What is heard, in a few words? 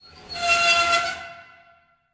Creak Metal High; Puddle; puddle plus ios gamerip 2018